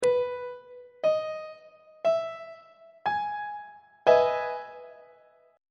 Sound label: f flat major 7th 2nd inversion; on treble clef